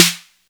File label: 808 Snappy Snare; Drums; Snares